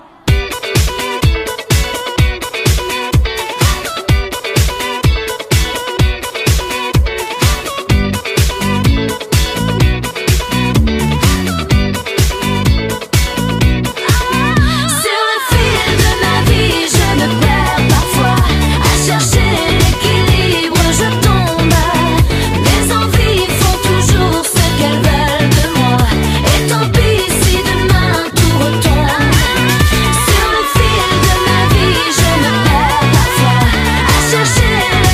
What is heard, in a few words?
ringtone